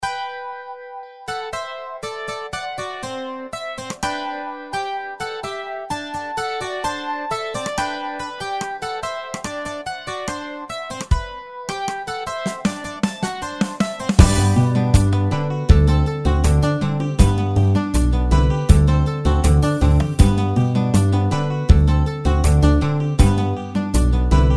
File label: Champeta Africana; Tsetse Music; afropop playlist